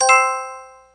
Pickup

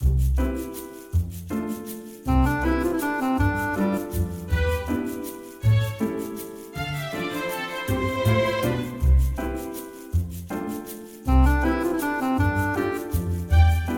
BGM Shop; aster tatariqus android ios mobile gamerip 2023; BGM ADV LarowShop

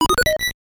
Sound Pause; TaleSpin; NES

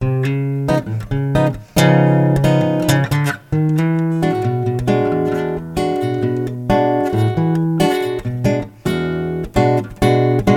Loop Samba; carte blanche for a fistful of teeth; windows gamerip 2006

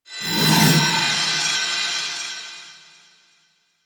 Field Mat Tap; Yu-Gi-Oh! Master Duel

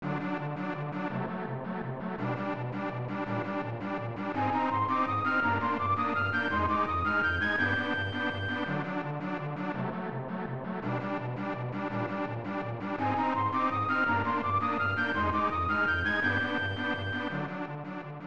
Battle (Command Phase); Wizardry Gaiden 4; SNES

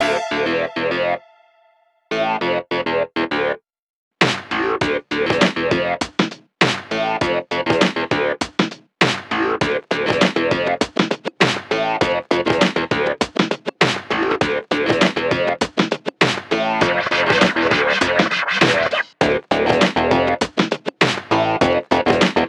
Music Breakdance; Costume Quest 2

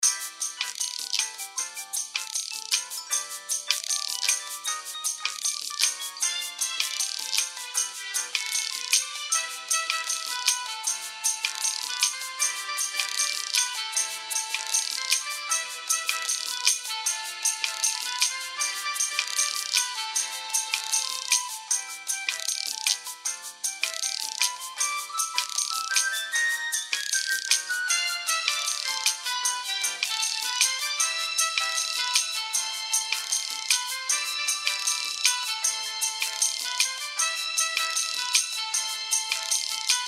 Elevator Music; Mass Effect

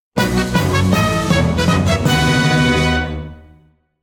Jingle Get Synchro; Pokemon Masters EX

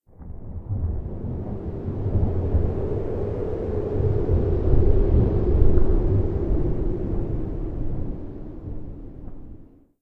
Empty Victory

